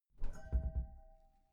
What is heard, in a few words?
glass door close soft glass clang